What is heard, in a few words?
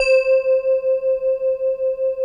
DIGIBELL C4; Greytsounds; Scott Peers Sound Engineering